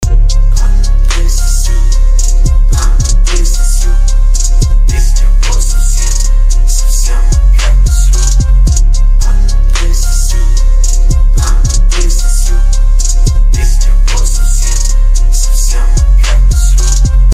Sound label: Trap